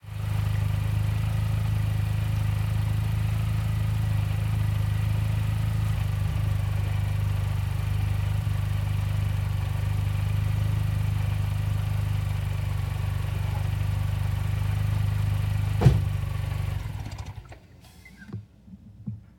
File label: mini cooper sidewalk idle close door and shut down; 2007